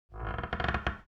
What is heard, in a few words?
slow creaking floorboard